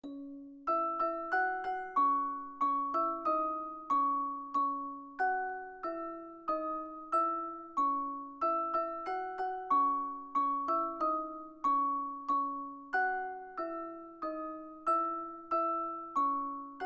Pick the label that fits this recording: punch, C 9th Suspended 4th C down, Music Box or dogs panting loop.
Music Box